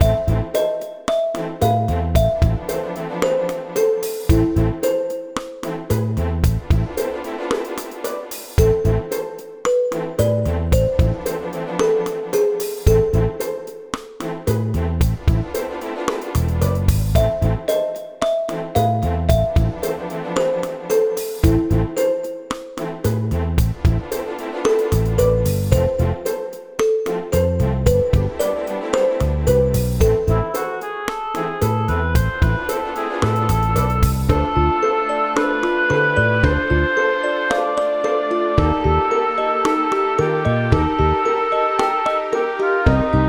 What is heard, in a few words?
Dungeon; Suits: A Business RPG